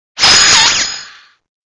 Grito de Snom

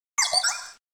Grito de Piplup

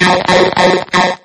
Grito de Krabby; Actualizado a la sexta generación

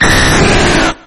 Grito de Dragonite

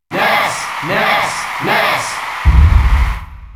Grito del público; Ness; SSBM

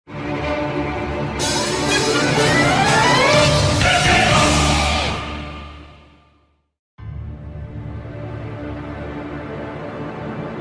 Tema de victoria; Sefirot; SSBU

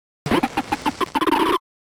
Grito de Hippopotas

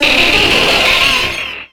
Grito de Parasect

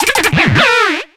Grito de Bibarel

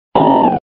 Grito de Barboach

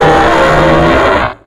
Grito de Exploud

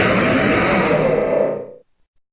Grito de Mega Aggron

